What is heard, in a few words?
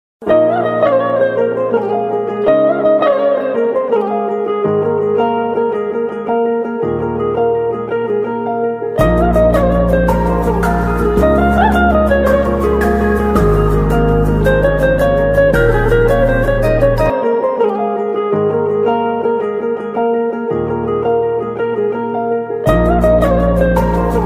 song bgm ringtone background music ringtone